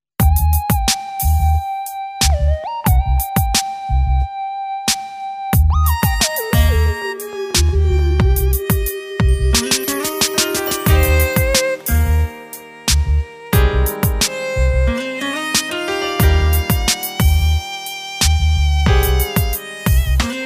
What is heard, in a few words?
violin version